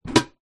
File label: household metal bread bin lid open